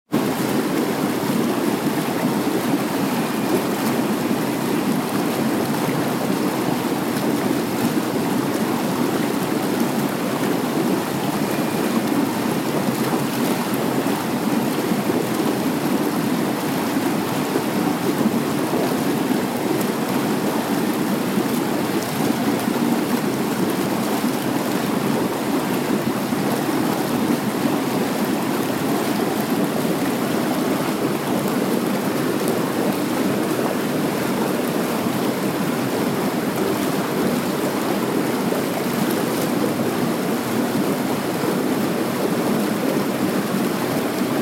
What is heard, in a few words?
hot tub; katsucon 2015